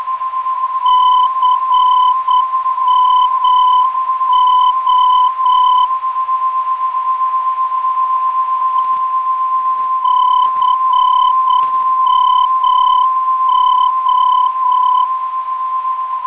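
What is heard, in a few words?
non-directional beacon; CMO; 389kHz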